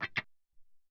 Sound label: Wah Gtr